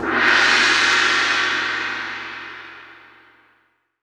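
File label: orchestra gong; Greytsounds VOL-1; ORCH PERC 2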